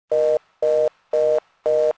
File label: telephone; Soldier Of Fortune II Double Helix; XBOX